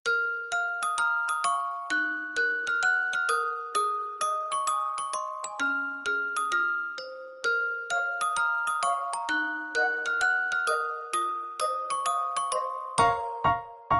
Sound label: sms iphone